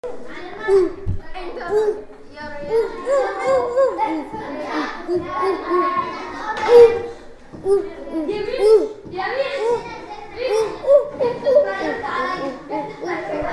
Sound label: oof song